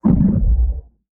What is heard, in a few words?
Multimedia Internet CD-Rom Flash Wipe